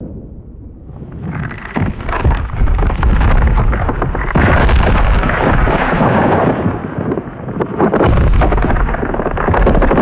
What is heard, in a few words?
deep heavy glacier ice cracking